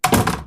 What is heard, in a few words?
leave game; RS Games Sounds; Battleship